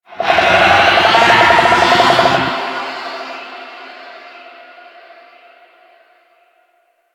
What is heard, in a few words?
Infested Golem Vocal Charge; Warframe